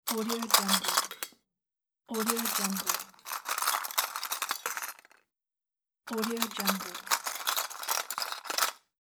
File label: metal clips taking out of box